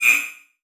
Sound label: Multimedia Internet CD-Rom Flash Whoosh Short